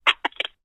telephone drop recorded through receiver, household